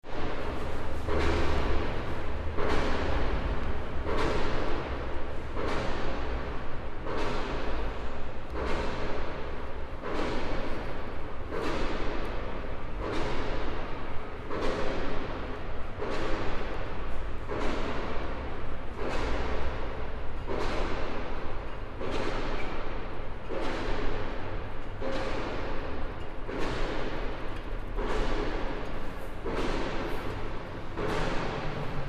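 binaural recording of pile driving as heard through the Trans Bay Terminal, San Francisco CA; Transit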